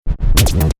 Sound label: Turntable Scratching; DJ (Sound Effects:Musical)